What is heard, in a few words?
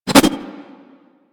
Tonfa Parry Whoosh; Warframe; Tenno